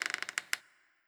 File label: Sarco Squeak